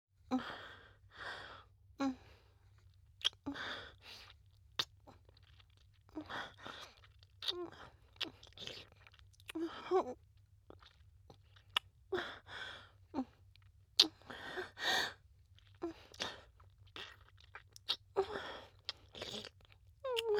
キス; SEあり

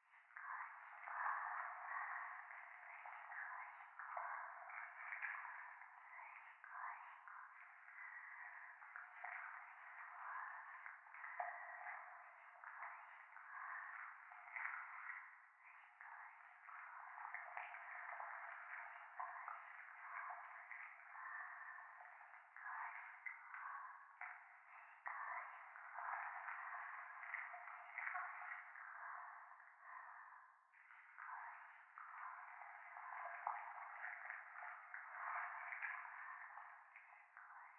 教会 しこしこ 触手

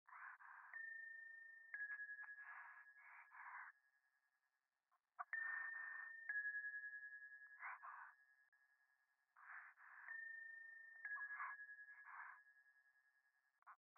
電話 左耳密着処女喘ぎ 神社

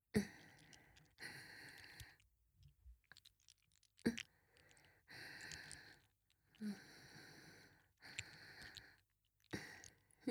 吐息+乳首弄りループ（優しく）

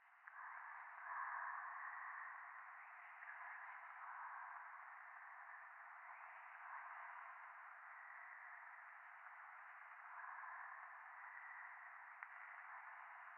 ゴースト しこしこ 雨

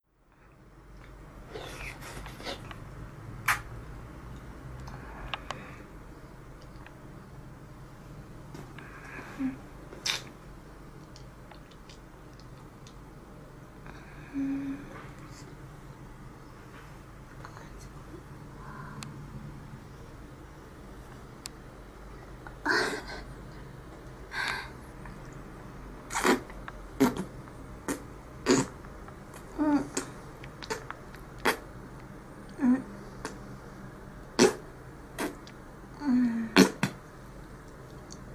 フェラチオ中に無許可で口内発射された美少女のジュポジュポエッチボイス

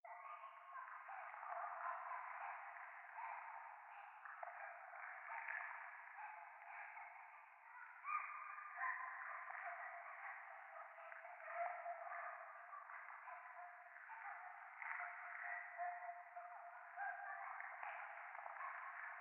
廃墟 可愛い喘ぎ 触手

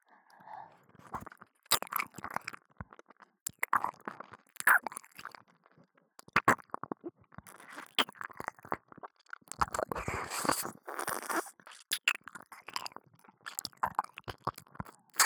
右耳舐め, ASMR; アドリブ集

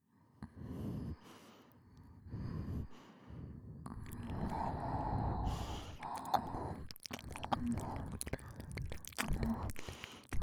ダブル鼻息耳舐め; 番外編

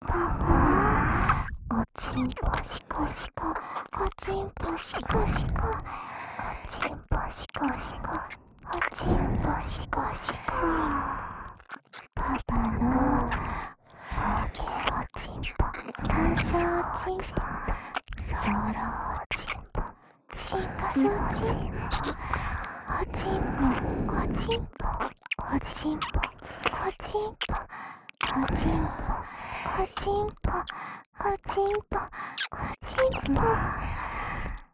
実の娘にバカにされたい！ ＋ 両耳キス; おまけループ（オナサポ）; 『パパのざぁこ♪ざぁこ♪』

